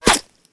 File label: bullet hit, Gore